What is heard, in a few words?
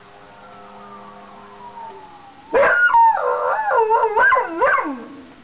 howling